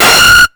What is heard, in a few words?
GEIGER, Hard & Loud Techno; USB Soundscan vol.01; AKAI